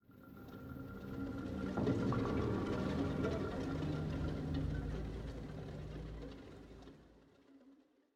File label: 85bpm; Research High